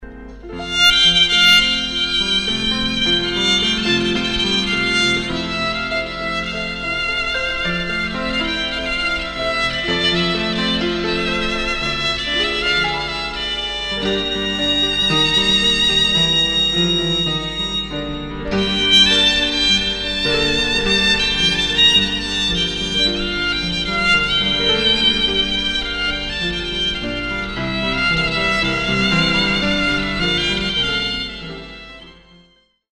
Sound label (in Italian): VIOLINO